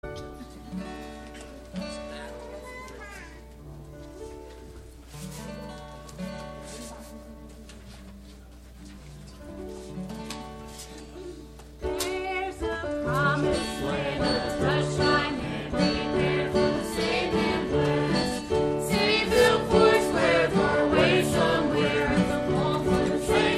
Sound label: Youth Choir